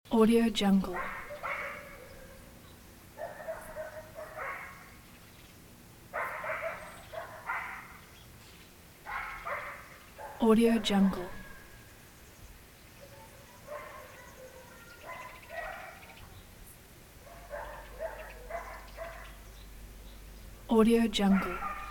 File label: countryside dogs at rainy afternoon loop; سر و صدای سگ ها در روست; nature sounds